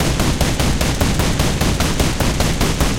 loop kwk30, guns tanks

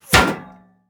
metal bullet impact; Rust

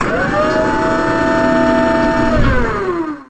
seaknight door open

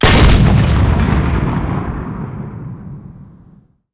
cannon 90mm m3 shot, tanks; cannons; War Thunder

